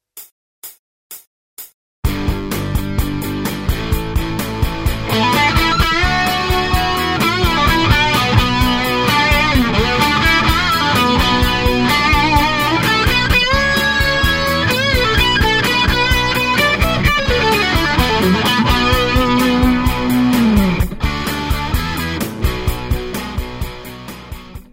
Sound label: solo